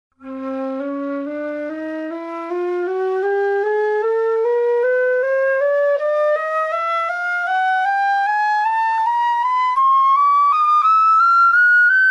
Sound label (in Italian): flauto